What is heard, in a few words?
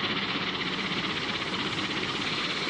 jetpack; player